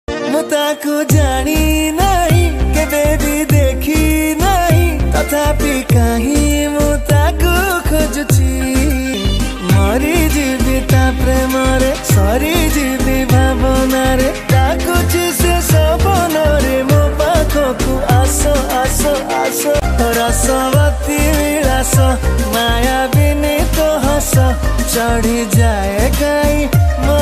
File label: Odia Song